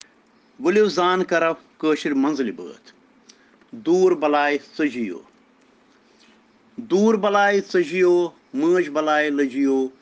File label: Children Rhyms in Kashmiri